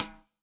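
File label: Snare Stick, drum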